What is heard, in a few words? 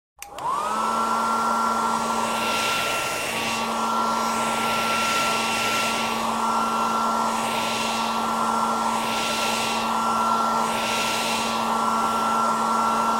Blow Dryer Turn on & Dry Hair on Medium Speed & Turn Of; Household